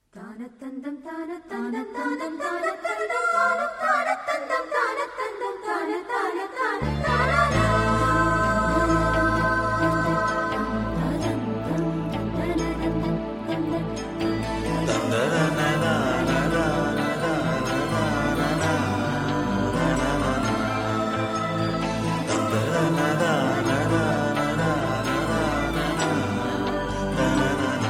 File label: Hum